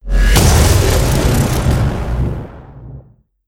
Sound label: Horror